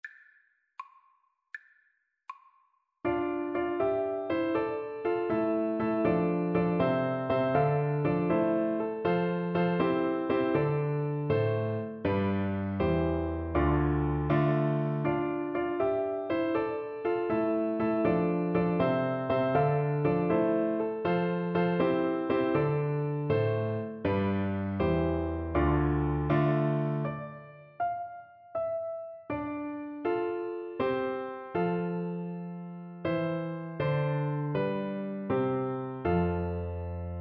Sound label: jenny plucks, Flute